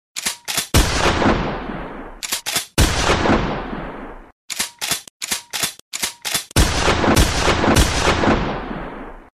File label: Shotgun Tripled